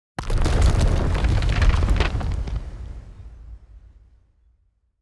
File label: building shaking, ambience